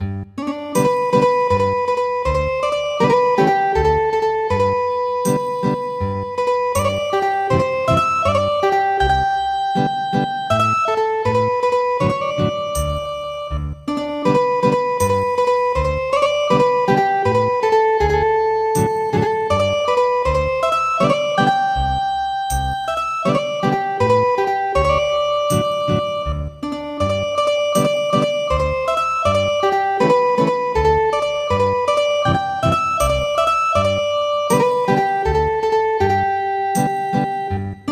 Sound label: Ethnic Guitar